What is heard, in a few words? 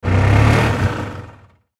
Golf Cart Motor; SPORTS; Blastwave